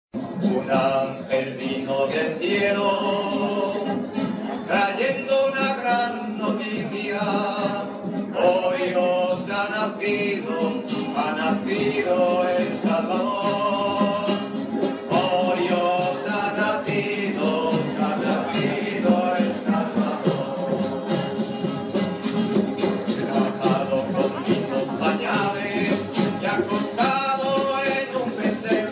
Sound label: Spanish song; Kiko